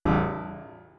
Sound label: piano note